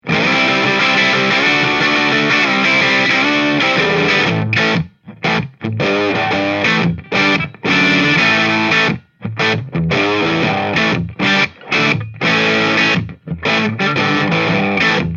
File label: Blues